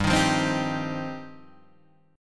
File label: F 7th Suspended 2nd G